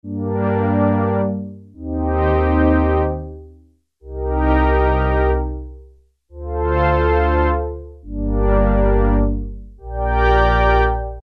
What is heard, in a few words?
Brass Swell, Roland Juno-106; Factory Preset